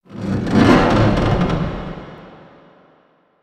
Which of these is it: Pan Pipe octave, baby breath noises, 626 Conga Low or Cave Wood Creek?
Cave Wood Creek